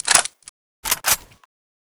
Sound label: ak47 clipin; weapons